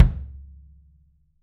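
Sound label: wood kick, left; 3D Drums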